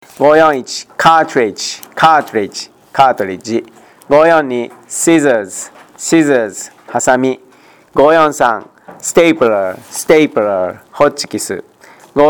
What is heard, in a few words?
tango